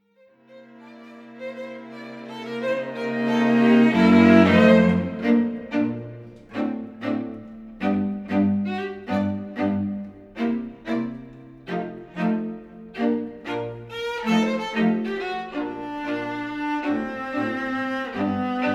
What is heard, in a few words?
Quartet Charleston; Rigaudon; Short